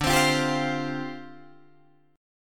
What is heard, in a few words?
D Minor 11th D down Guitar Drop D